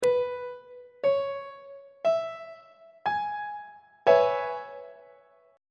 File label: d double sharp major 6th 2nd inversion; treble clef